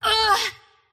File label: pain; windrunner